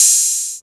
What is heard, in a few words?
open hat, c4; BGM; Nintendo; July 2020 Gigaleak